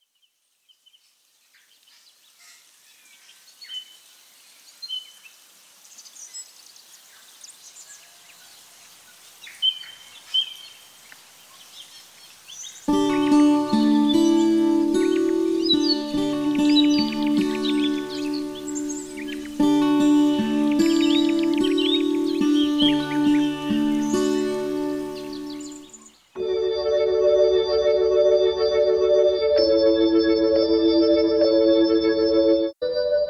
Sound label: organ